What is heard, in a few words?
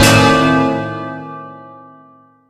survival medal; Left 4 Dead 2